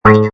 Cartoon Sproing, Cartoon boing or bounce

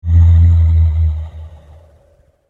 Deep Haunted Moan, ghost sound effect; horror; Andrew Fleming

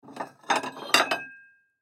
Household China Cabinet Remove Plate Clank Ring; Dishware; Blastwave

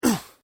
Pain Umph, Male Painful Grunt, Hurt

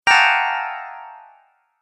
Sound Fail

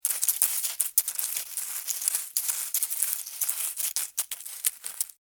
Insect Dig Dirt, Insect, Dig, Dirt, Bug, Movement; Animals:Insects; Miscellaneous Insect; Blastwave

